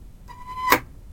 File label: key